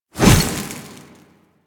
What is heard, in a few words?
Hover Whizzby Angry; Warframe; Pax Duviricus